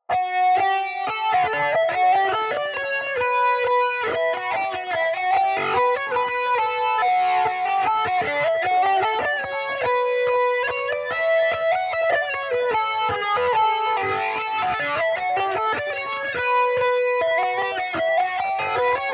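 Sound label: D major dirty revelation, irish mandolin; The Land Of The Living; Revelation Jaguar electric mandolin; dirty, heavily distorted, amp setting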